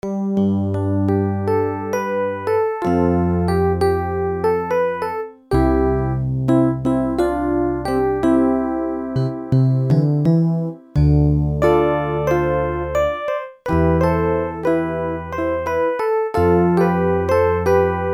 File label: Rhodes